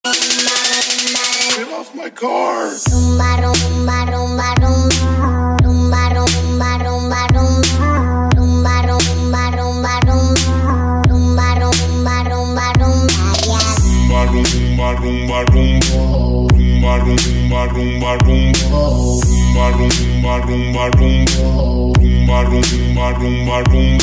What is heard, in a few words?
Rumba Rumba Bass